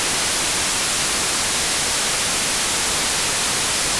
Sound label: noise